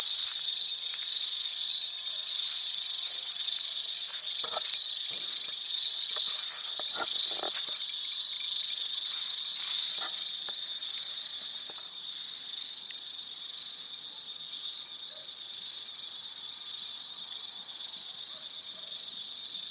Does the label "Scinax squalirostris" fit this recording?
Yes